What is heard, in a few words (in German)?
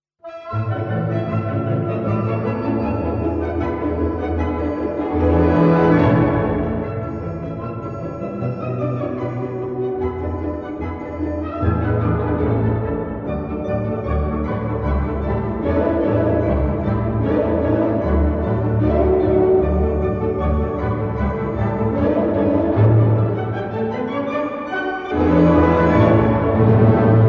Musik; bauphysik